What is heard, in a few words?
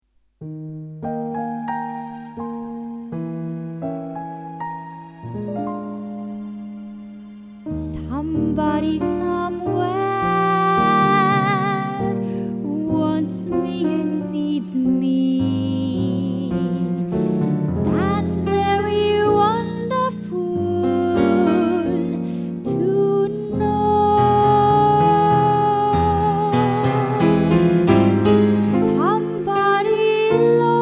song